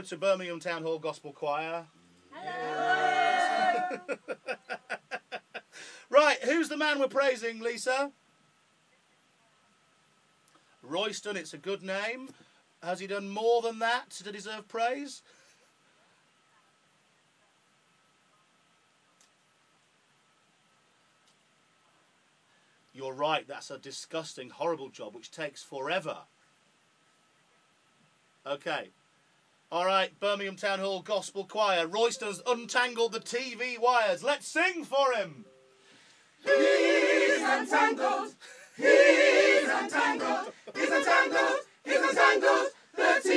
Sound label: gospel choir live; town hall; heart fm